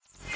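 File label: Battery Indicator-Machine Light-On Reverse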